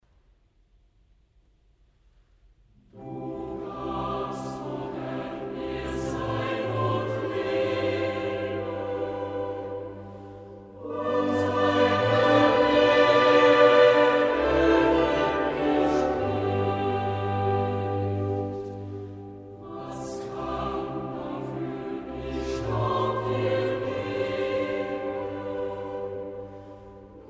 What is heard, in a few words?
Choir, Zum Offertorium; Choir St Augustin Vienna; DeutscheMesse